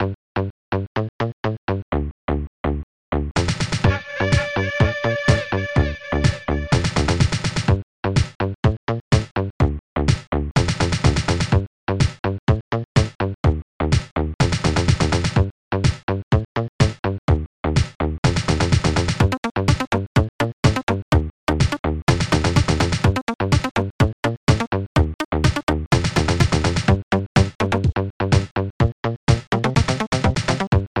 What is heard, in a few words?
acid beat